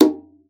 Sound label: Conga, Session Instruments; Best Service ProSamples vol.42